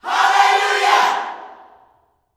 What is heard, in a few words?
ALLELUJAH, Vocal Planet Gospel YELL SHOUT; Spectrasonics; Vocal Planet Vol.1 Gospel; akai